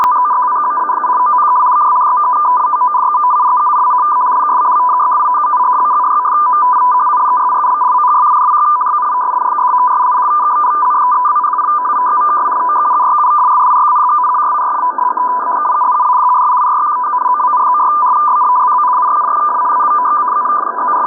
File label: FSK; f1b 200